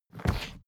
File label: briefcase plastic slide hard; industrie wirtschaft; bueroarbeit